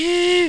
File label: Dryer